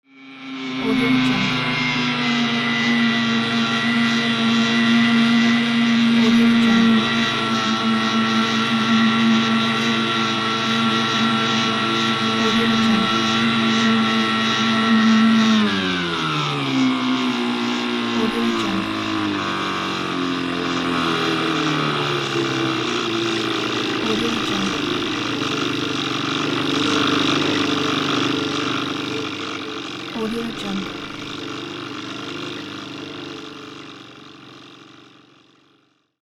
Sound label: motor boat at sea